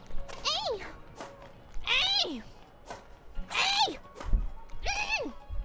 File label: noises; BadmintonRyS; IRyS